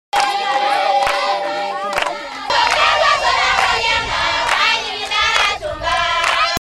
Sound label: samburu tribe